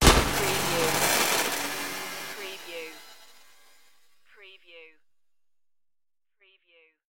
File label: abstract digital morph